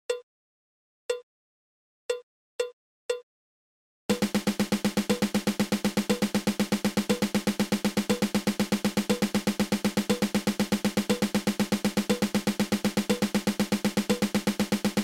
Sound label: Double Stroke Roll